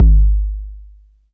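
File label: bass drum 808 Sat Lo Decay C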